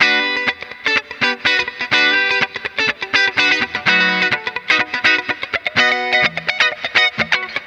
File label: LICK, Disco Fever; Best Service ProSamples vol.48 - Disco Fever; AKAI